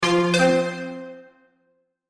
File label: Chime Musical, Chime Musical Multimedia